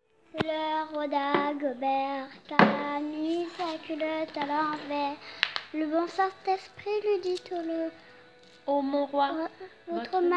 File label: Vocal